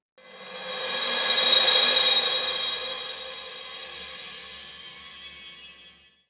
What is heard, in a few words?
cosmic bells, cymbals electro